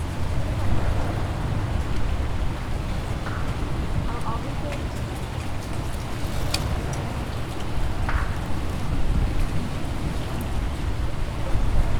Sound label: Soundscape